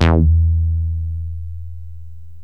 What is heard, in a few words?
funk bass, yamaha rm1x synth